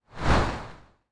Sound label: Swoosh